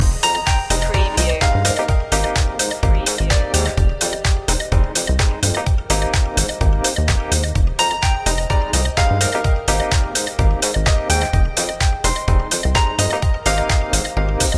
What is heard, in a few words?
stash up loop